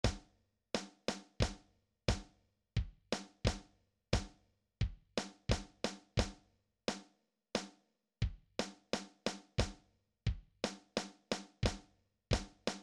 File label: Warm-Up, drums; Drum Recordings